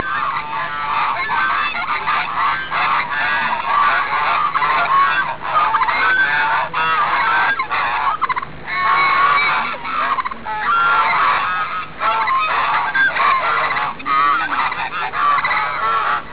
GEESE

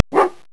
DOG

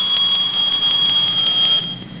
whistle

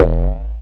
POING

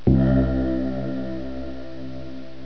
DING

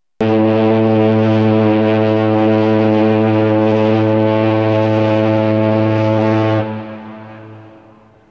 FOGHORN

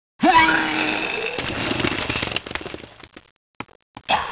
PUKE

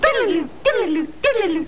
WAVES

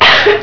AHHH

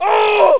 AGONY

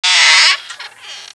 SHUTTER

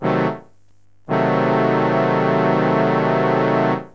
DIMIN, LOW BRASS

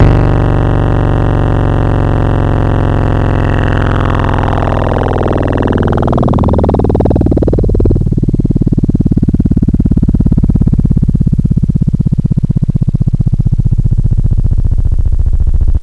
FLYBY

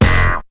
SHOT

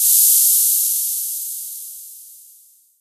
steam

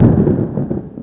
BOMB; WINWAR

